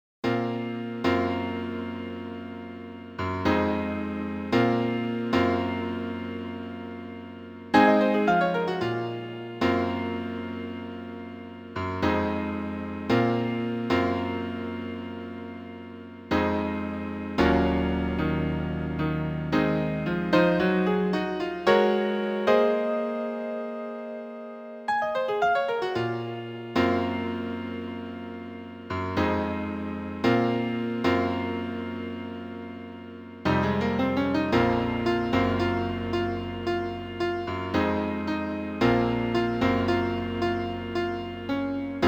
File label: piano